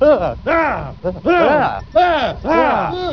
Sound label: ahh